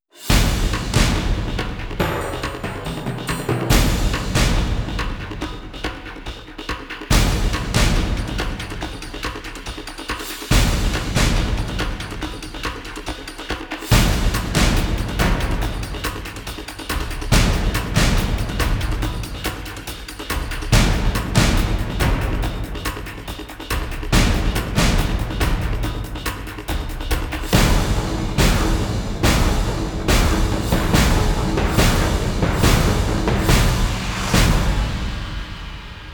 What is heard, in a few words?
percussion only